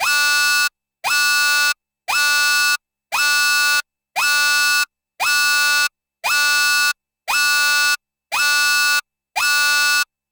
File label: Fire Alarm Triggered; blindside